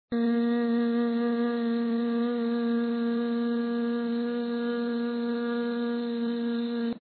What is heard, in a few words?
Buzz; Feed Fribbit Colors